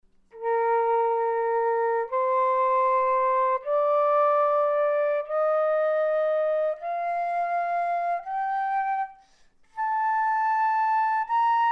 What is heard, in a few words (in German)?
Querfloete